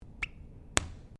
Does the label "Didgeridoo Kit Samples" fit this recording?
No